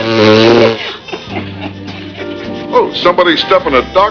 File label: fart; caddyshack